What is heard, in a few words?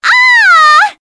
ahhhh; amy rose